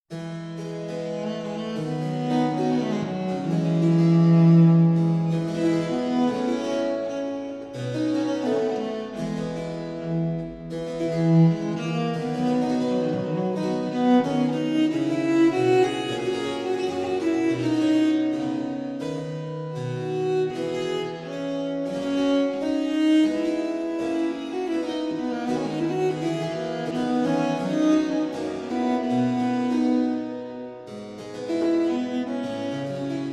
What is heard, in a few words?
pipa